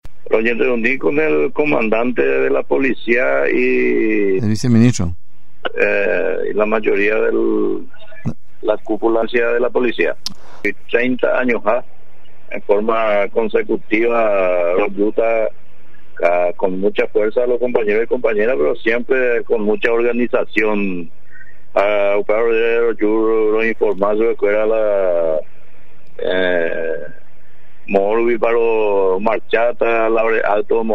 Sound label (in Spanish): marcha campesina